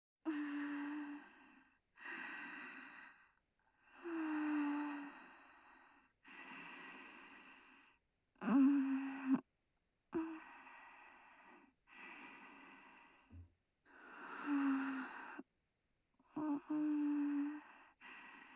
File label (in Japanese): 寝息（センター）鼻息